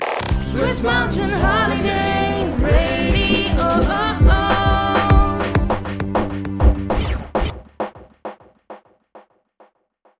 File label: instrumental and accapella